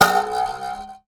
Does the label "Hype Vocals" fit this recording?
No